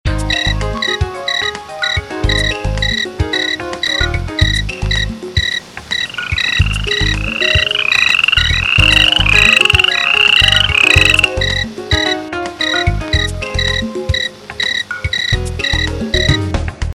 tieng de keu

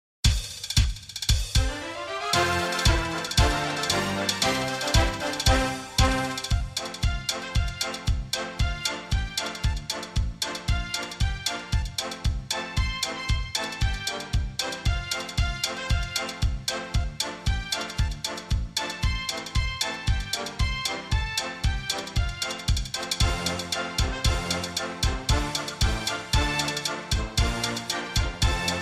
nhac beat

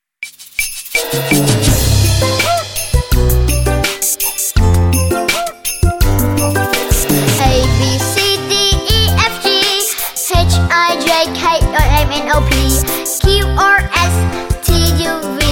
bai hat abc mam non; do thi sai dong

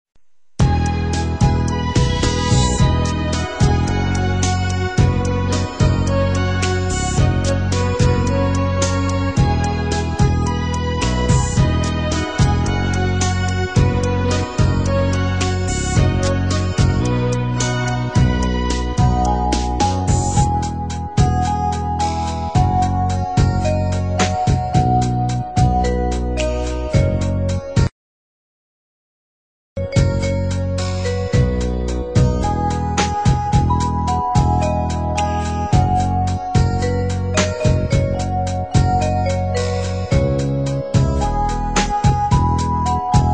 nhac khong loi beat

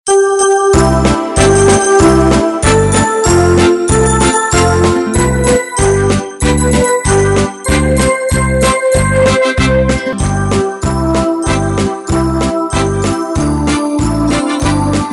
beat

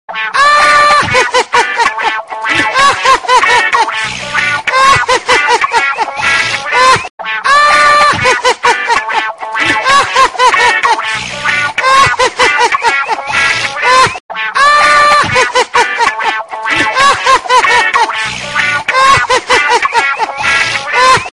tieng cuoi

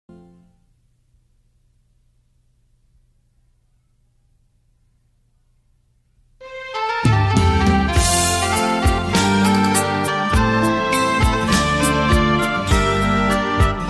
karaoke

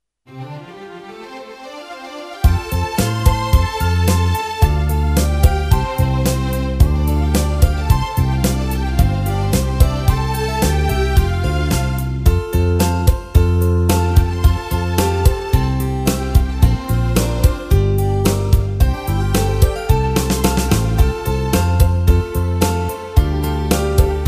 nhạc beat

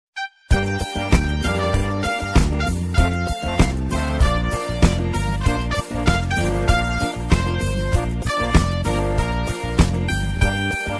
beat

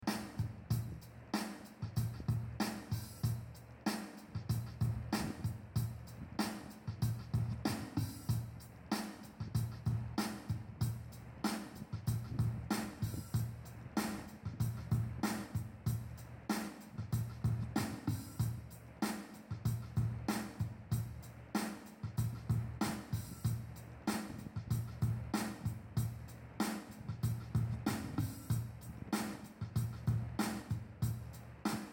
nhac vè

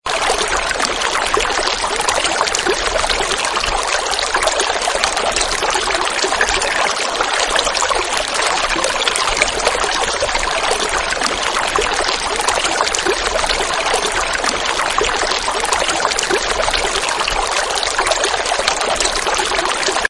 tieng suoi chay

